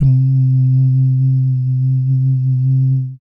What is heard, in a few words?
bass dums; Spectrasonics Vocal Planet; Jazz FX